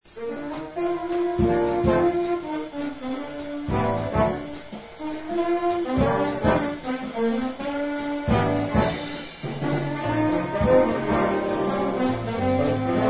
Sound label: pig, bigband